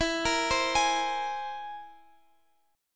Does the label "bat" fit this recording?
No